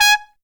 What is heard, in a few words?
TRUMPET FX, Phantom Horns; Zero-G